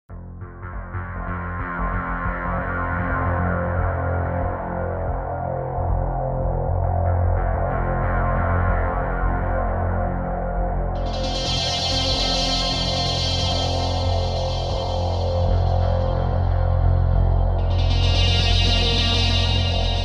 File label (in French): guitares echo; Dracula 5: The Blood Legacy; Souterains